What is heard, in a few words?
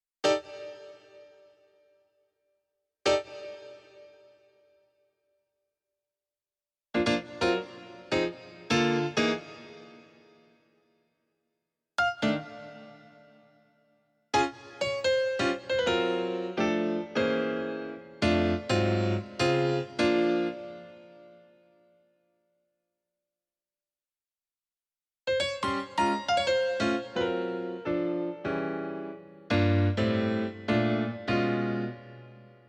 piano